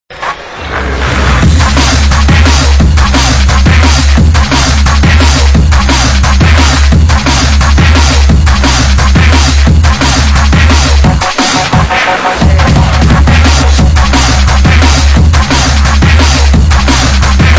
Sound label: Dead rock